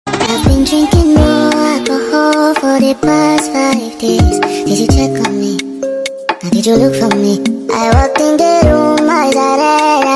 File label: Sped Up